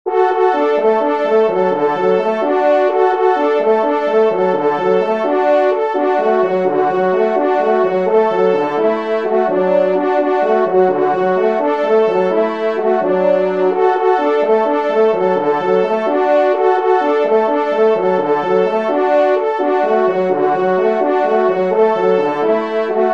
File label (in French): Pot Pourri, trompe; 2e Trompe